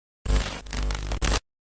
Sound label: parasite